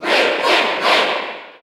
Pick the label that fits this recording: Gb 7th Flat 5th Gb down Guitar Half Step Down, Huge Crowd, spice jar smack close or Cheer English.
Cheer English